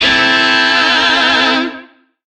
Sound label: Shawzin Pent Maj Chord; Warframe; Lizzie Shawzin; Chords